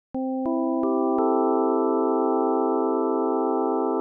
long A long R

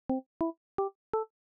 short A short R

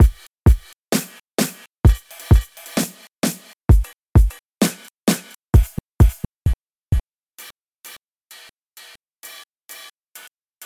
Dive Break Slices

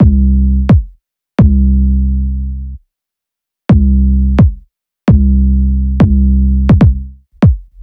kick bass; LANDR

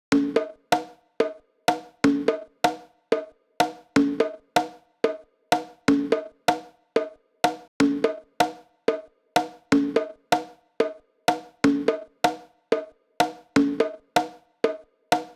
Conga No Reverb

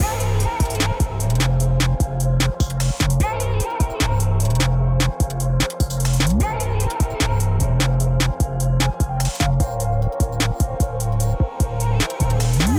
Mixed Low End

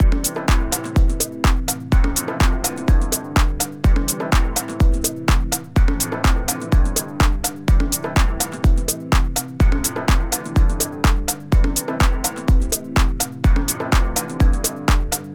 Loop With Reverb

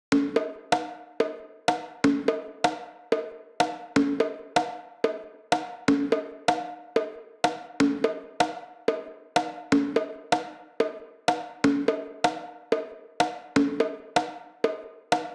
Conga With Reverb

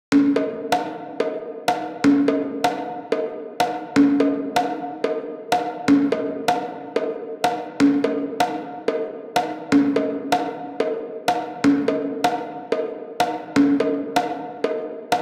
Conga Stage

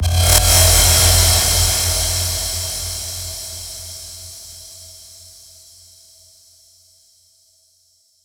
Create Ambience; LANDR

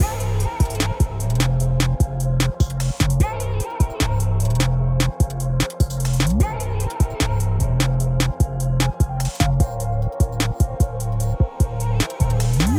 Mixed Low End Mix

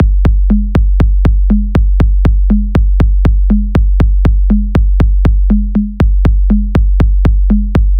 loop straight; LANDR

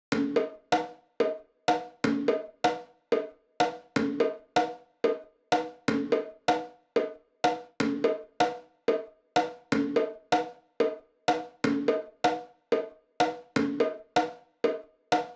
Conga Bathroom

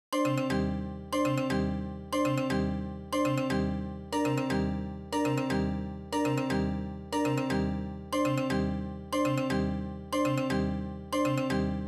melody solo

